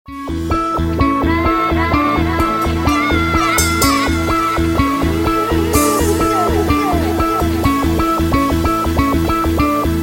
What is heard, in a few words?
groove